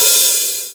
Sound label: JV80, Roland JV80, Drums